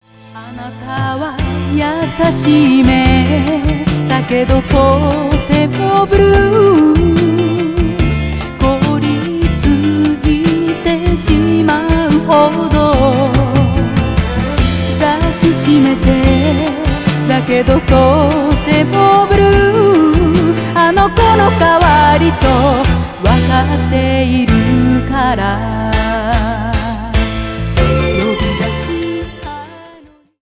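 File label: idol female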